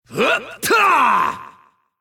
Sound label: Mob Voice Vicious Hunter Attack